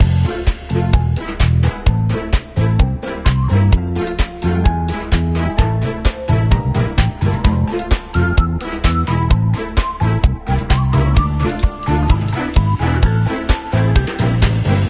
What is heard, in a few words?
Game Loop, music; Food Truck Game